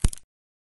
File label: branch break; ion sound